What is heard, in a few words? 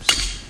ringer, horseshoes; game; cli-games